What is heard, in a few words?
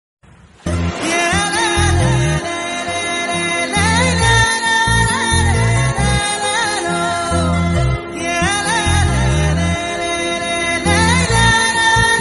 Love Humming BGM Ringtone